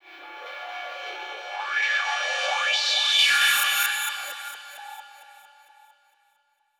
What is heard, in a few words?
Techno Crash Reverse; Dance DJ; Drums; Cymbals; Digidesign; Pro Tools Essential Loops